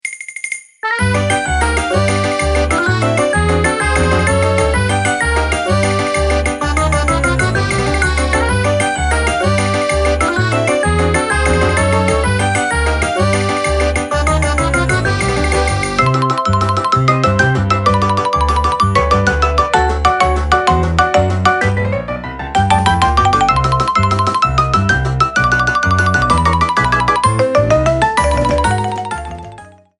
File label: Spinning Polka